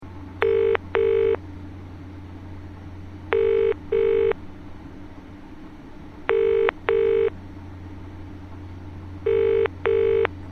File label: Telstra GSM ringtone